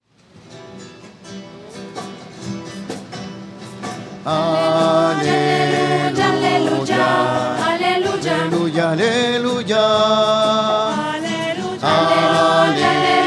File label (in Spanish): aleluya voces; misa